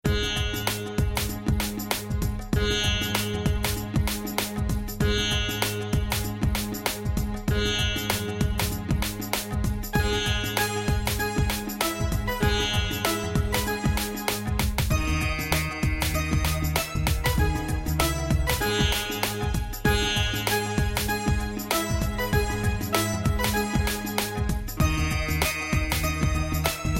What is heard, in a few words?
Bhangra march